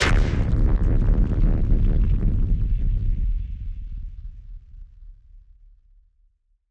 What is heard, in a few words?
Hit Splosion; musicradar; bomb factory